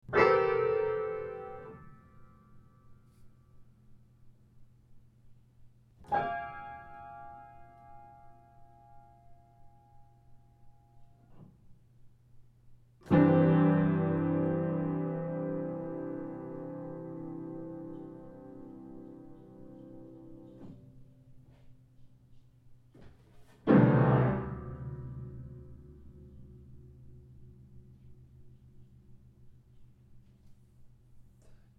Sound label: uncontroled piano hits